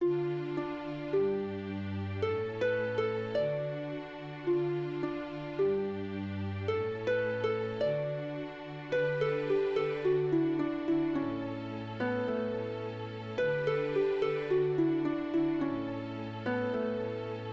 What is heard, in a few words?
harp and strings